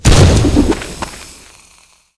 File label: Pistol; Special Roiba; LooterKings